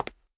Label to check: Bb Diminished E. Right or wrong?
Wrong